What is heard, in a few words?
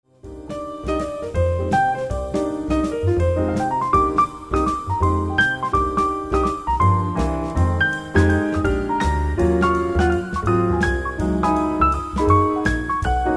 Piano Jazz